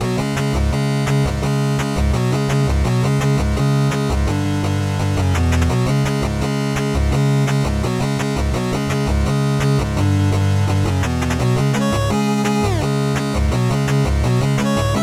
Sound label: music